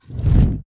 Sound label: Fire Lighting Burst; SoundsCrate